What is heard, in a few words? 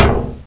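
crowbar hit; weapons; caged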